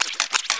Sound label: SCRAT; E-Lab; X Static Goldmine 2; akai